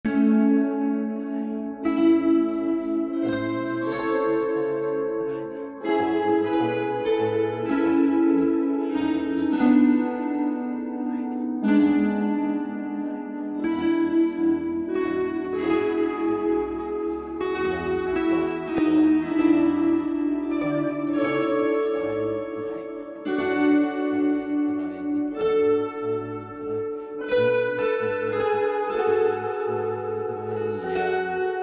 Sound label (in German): Harfe Musik